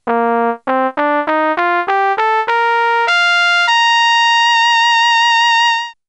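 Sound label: Trumpet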